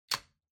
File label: Wall Outlet Dry Plug In Hard